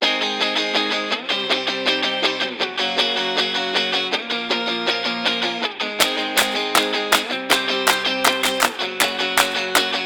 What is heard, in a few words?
Indie rock Pop Rock Indie Man no lead; Jukebox Indierock ssm-Pop-Rock-Indie-Man-no-lead